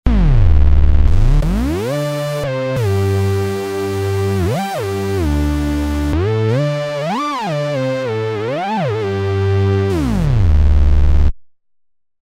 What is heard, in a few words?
Raw'N Beasty, Roland V-Synth; Factory Patches